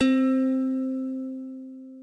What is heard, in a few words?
C Guitar